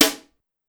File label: Snare, Clavia DDrum-AT, Pitched Up